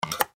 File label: Hanger Hang Metal Shirt Clothes; Closet; Household